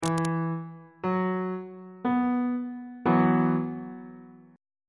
c flat suspended 4th 1st inversion on bass clef

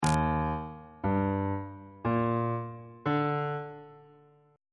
g minor 7th chord 6 is e flat major 7th

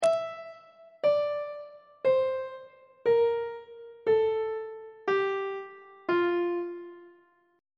f major scale descending; on treble clef

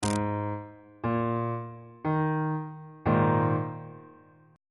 g sharp suspended 2nd chord on bass clef

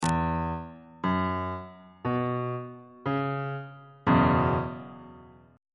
f sharp dominant 7th suspended 4th 3rd inversion

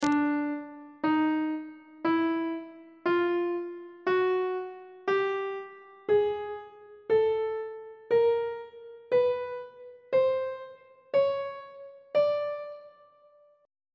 d chromatic scale; note positions; treble clef

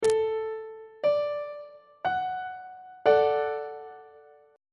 e double flat major 2nd inversion; on treble clef

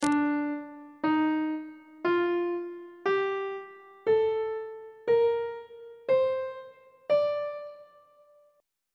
d phrygian mode on treble clef

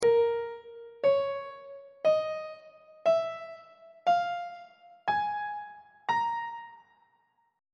b flat blues scale on treble clef

